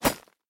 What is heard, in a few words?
mining click